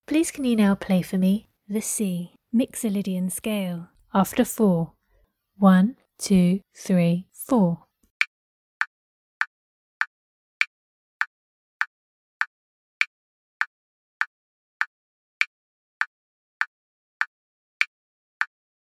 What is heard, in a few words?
C myxolydian scale